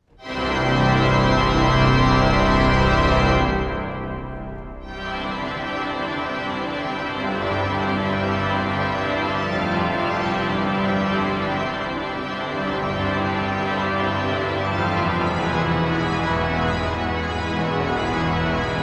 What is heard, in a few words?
Toccata; M Leclerc